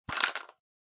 Pencil Case Open

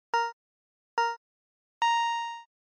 Racing, Countdown; SNES

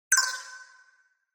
Cyber

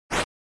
SNES RPG Attack Sword

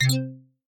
Sys Set pop down

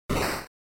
Noise Phrase, NES; NES-General

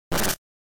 Noise; GB General

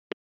Telephone Signal Tone Noise

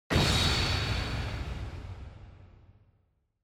Impact; Onoma-Impact

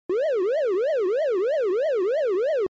Arcade General LFO

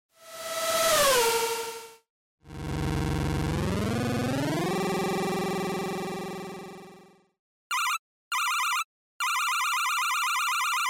Racing

